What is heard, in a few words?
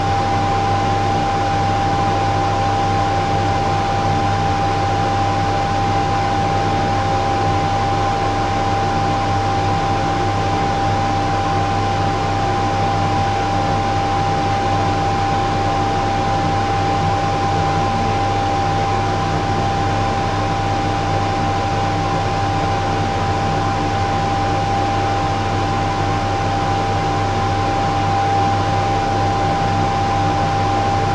Extractor Loop, Extractor Fan Kitchen; Extractor Fans